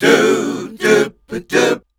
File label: Voice Spectral, H PHRS